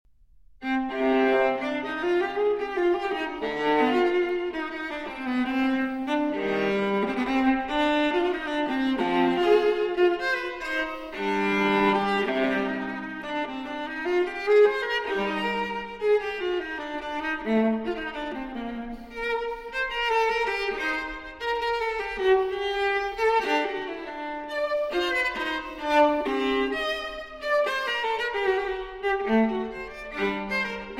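Cello Suite No. 5 in C Minor Transcription For Viola Courante; J.S. Bach; BWV 1011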